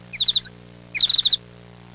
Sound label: Miscellaneous bird chirping